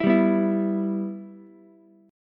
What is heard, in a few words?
E-Minor, Ukulele; strummed; JGuitar